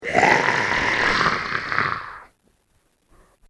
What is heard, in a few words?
Beast In The Pit, monster sound effect; horror sound effect; Andrew Fleming